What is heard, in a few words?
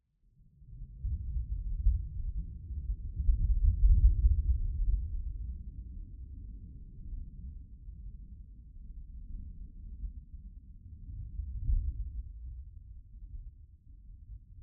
Thunder distant faint; boom library; Thunder And Rain